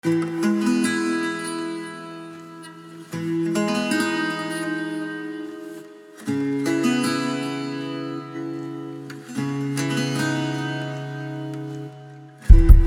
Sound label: guitar crap